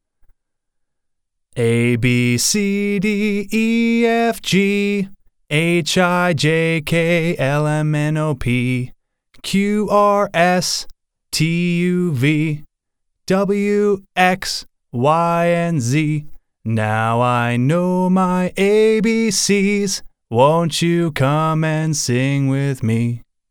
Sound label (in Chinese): 儿歌